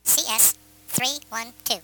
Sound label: Change Speed